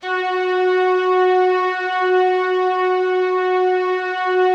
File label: F#3, STRINGS; Yamaha VP-1; Music Store; JODYSTRINGS